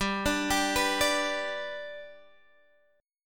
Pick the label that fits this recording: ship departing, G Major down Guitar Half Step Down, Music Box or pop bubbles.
G Major down Guitar Half Step Down